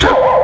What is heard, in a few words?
alarm; Ueberschall; Techno Trance Essentials